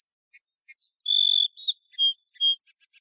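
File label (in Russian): ликующее пение одного воробья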